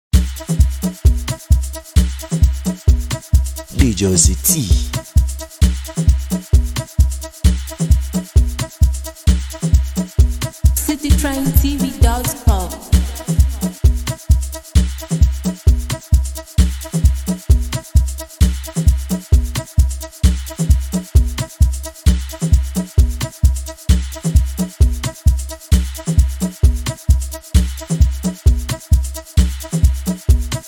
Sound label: Freebeat Instrumental